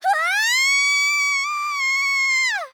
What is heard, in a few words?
Death Fall; Fairy